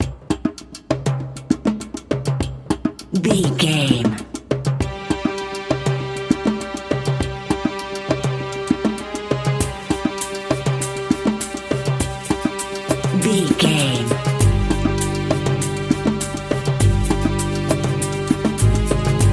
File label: indian soundscape background music, An exotic and colorful piece of World music. Groovy, powerful